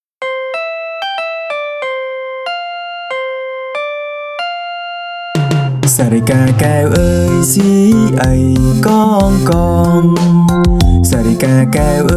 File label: Parrot Excersises; សារិកាកែវពត់ប្រាណ